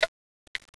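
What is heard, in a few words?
Rolleiflex Automat, camera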